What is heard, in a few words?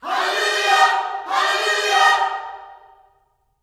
HALLELU, Gospel; HALLELUJAH; Spectrasonics; Vocal Planet Vol.1 Gospel